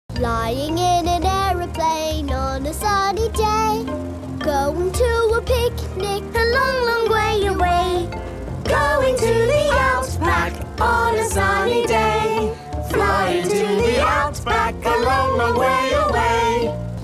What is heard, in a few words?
Outback Song; Peppa Pig Outback Song; peppa pig world adventures